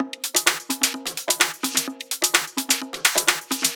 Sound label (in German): Drum Loop 128BPM FULL ohne BD; Vengeance - Essential Clubsounds Vol.5; VEC5 Drumloops; VEC5 Multi Loops 128BPM